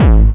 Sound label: Short Bass